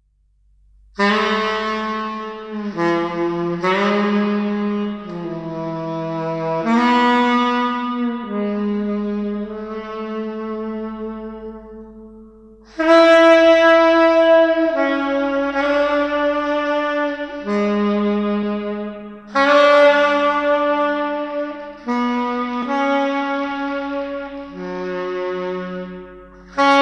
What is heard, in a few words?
Saxophone